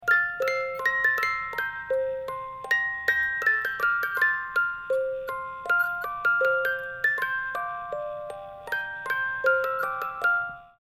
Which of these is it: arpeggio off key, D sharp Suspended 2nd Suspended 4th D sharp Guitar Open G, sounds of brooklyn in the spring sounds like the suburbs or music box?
music box